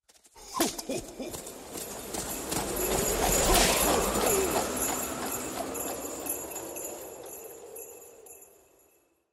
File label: sled